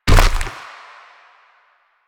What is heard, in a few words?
Proto Infested Runner Step Default; Warframe